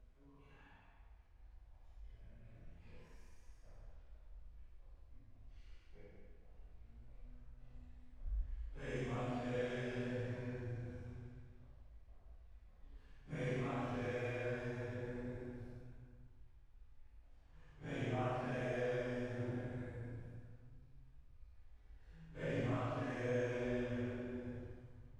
Choir

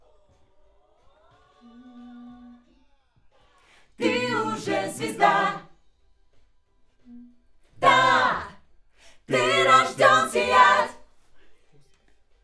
Schoeps AKG, piano

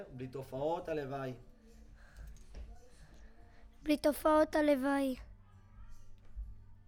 VOC

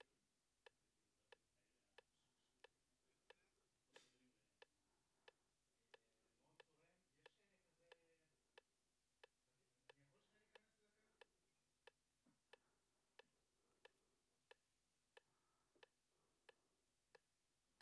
Vocal

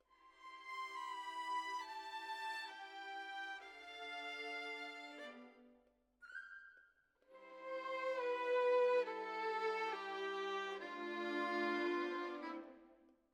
Violins, jazz for piano

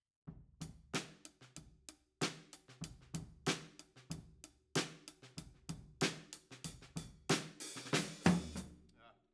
Overheads, 94bpm

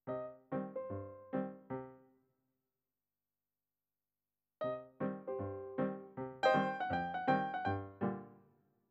piano, Ragtime

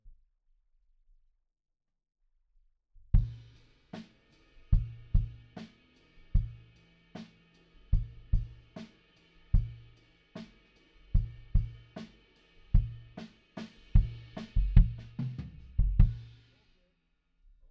kick in

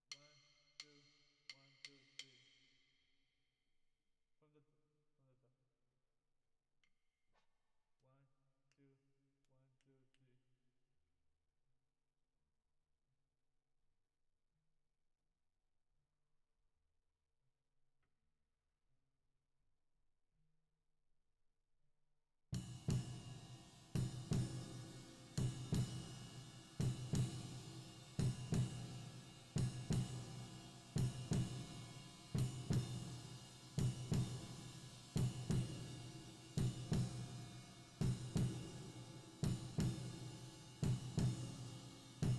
Drums Verb

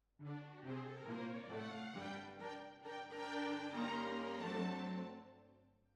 Cbasses

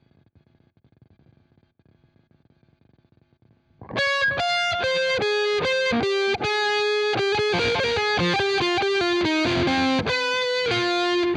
Guitar

